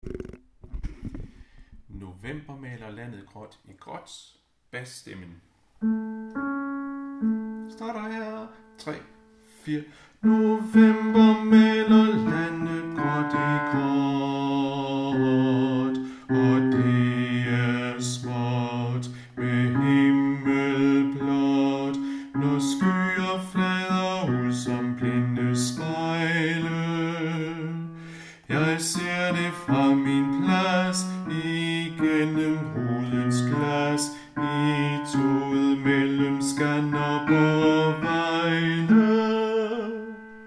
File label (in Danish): bas, harlev koret